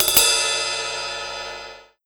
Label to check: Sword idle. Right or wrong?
Wrong